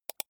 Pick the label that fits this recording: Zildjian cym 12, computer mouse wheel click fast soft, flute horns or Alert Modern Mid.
computer mouse wheel click fast soft